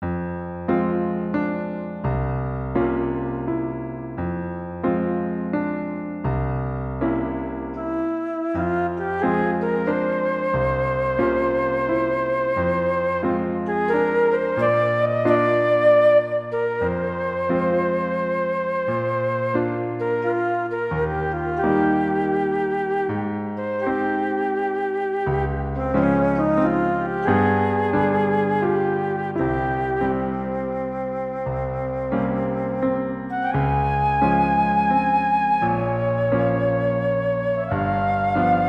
Heartbeat; Infection; The Anthem; 姦染1

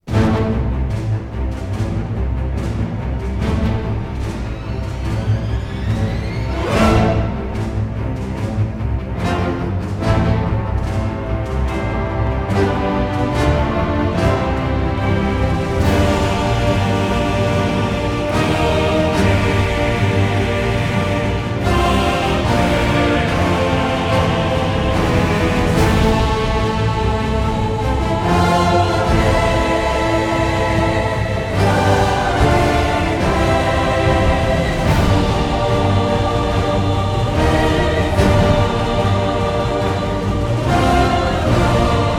epic battle; heroes of the storm full gamerip 2015; kingscrest

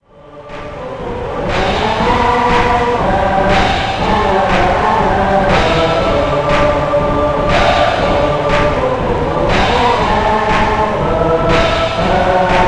Chants; Moero

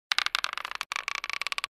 Creaking; Eternal Darkness Requiem

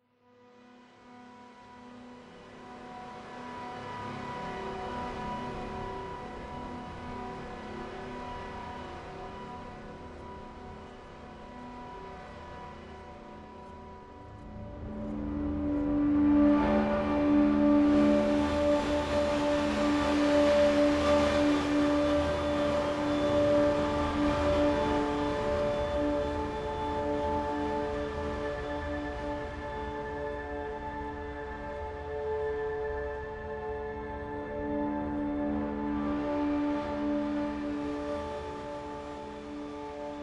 Suspence Pad, Low Intensity; apex legends season 12

